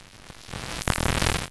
shot emp sparks

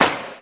shot gun

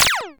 shot laser

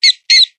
mouse; rogue savage rats

